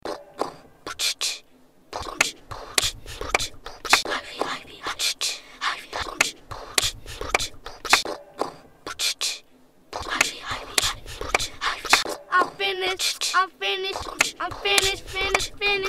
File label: Beatbox